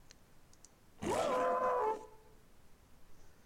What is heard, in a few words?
Animal Call Wolf